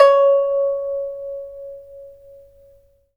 PIPA C#6 P; Spectrasonics; Heart of Asia

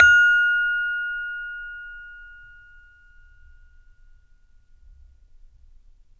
SARON F 3; Spectrasonics; Heart of Asia

